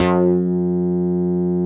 MEGA MOOG; Rubber Chicken Software Sample Bank

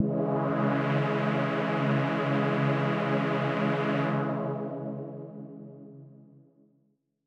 Dramatic C#3; KG MegaSaur

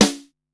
Snare, Korg Wavestation-SR, Drum Kit; Wave 455 - Drum Kit

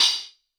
Soft Crystal, Hats; Airwindows

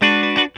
LIKKA CHORD, Funk Gtr & Wah; PlatinumPhattCD2; E-MU Producer Series Vol. 8 – Platinum Phatt

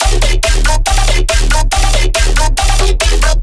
bass loop brutal, 140, D; Virtual Riot

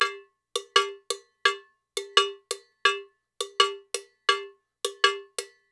Percussionworks

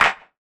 clap, hip; Best Service; Dance Mega Drums

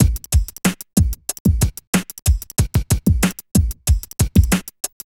Beat; Sonic Foundry (Sony Creative Software); Infinite Soul VOL-2; Beats

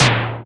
Noise Tom; Roland MV8800; SONIQ DRUMS

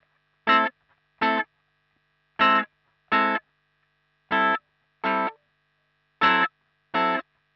125 wah 4 chords falling A; PowerFX - Nu Jazz House

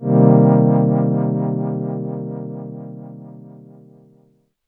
VIB PAD MIN, Synths; JUNO106

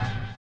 STREET; Spectrasonics; Metamorphosis

